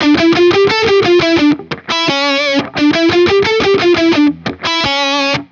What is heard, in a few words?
Metal Guitar Loop, 174 BPM D Maj; Cymatics; Vibrations Guitar Sample Pack